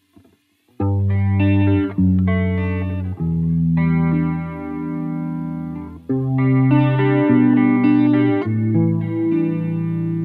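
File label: clean, guitar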